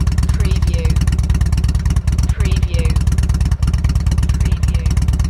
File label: Motorcycle Harley Davidson Idle Loop Exhaust Perspective